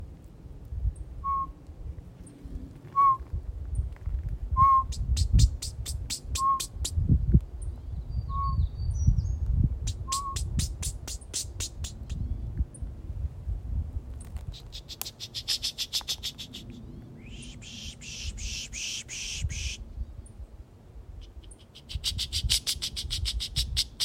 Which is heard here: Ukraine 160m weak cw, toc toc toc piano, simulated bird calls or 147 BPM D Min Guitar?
simulated bird calls